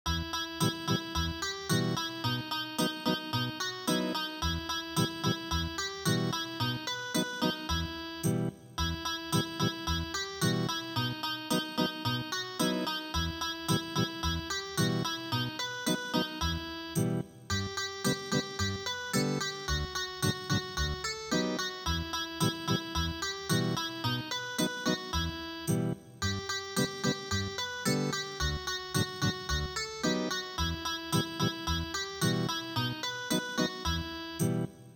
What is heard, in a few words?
Ethnic Guitar, traditional